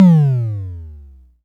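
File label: Analog Kick, dry kicks; Quasimidi Rave-o-lution 309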